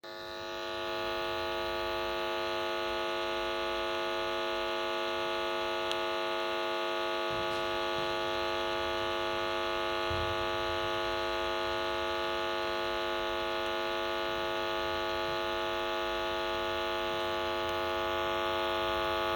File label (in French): 50 hz La ronflette; champs electriques; l'impact des champs electriques sur notre quotidien